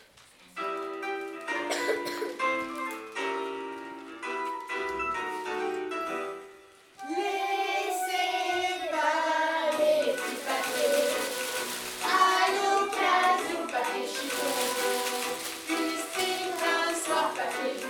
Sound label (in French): instrumentalise